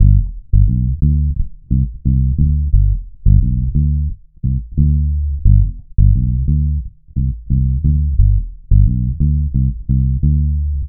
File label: Dub Bass, Electric Bass; Dub Bass Strategies; Sonic Foundry (Sony Creative Software); Leo Cavallo Bass Taster